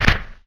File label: Kick; Hilzartov; Fairy Size Doesn't Matter